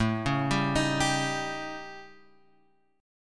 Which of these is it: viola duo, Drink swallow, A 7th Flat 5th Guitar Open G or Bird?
A 7th Flat 5th Guitar Open G